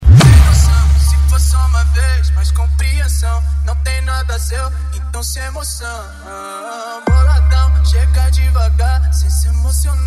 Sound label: Funk MegaFunk